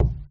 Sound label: ball cushion, pool